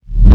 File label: kick reverse, Drums, One Shots; Slate; SD AURORA Sample Pack